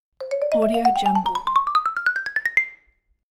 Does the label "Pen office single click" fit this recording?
No